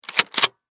Gun Shotgun, Gun, Shotgun, 20, Gauge, Rack, Slide, Load, Bullet, Into, Chamber, Winchester, Model, 1400; Warfare; Shotgun Sound Effects; Blastwave